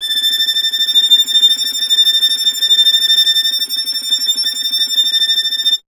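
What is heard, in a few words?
string violin, Vln Trem; Miroslav Vitous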